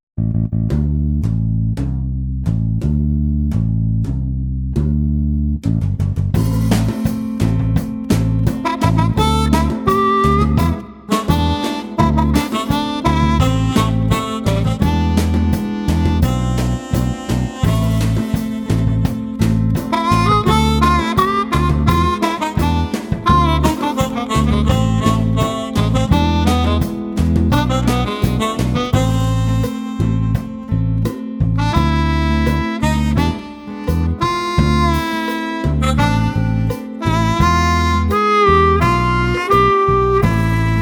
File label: XB-40 Diminished, harp